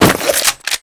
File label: pack pickup; mp scavenger pack pickup